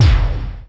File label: LASER; NIHILIST